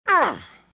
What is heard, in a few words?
grunt, pain death, male; Soldier Of Fortune II Double Helix; XBOX